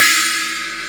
China Crash Cymbal

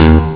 short bass; robocop; ST-XX Amiga Soundtracker Sample Packs

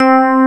FM EP C3; Proteus 2000; Digital Sound Factory Proteus 2000

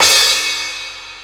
Hont Crash, Cymbal; KJ Sawka; Drum n Bass & Beyond; Drum Tool Box

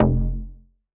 Bass F, Bass Synth; 99Sounds; Monosounds; Music Loops; Instrument Oneshots

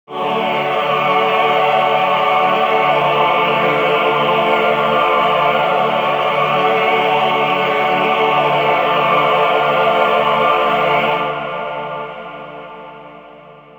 M-Tron Choirs; AMG - Prototype Drum & Bass

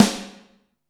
LONG TAIL, SNARES; Back In Time Records; Big Bang

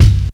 KICK, Big Beat; Big Beat Samples & More; AKAI

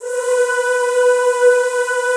ZZZ B3, Classical Choir; Best Service; Extended Classical Choir

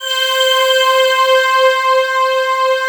FINAL PAD; ENDINGPAD

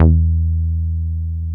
BASS, Tekno Industrial; East West

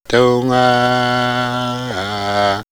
Ethno Vox Eskimo Chant; vox mix; X-Static Goldmine

